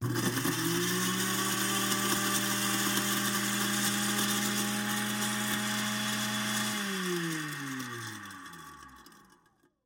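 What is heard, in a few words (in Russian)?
звук работающего блендера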